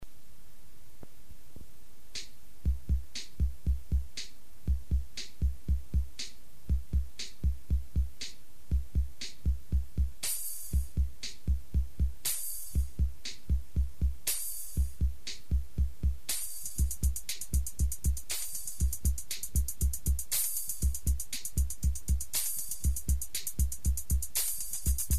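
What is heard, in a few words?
drums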